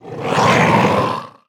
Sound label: Felhound Play